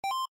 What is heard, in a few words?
coin collect; grade1; math; adding upto hundred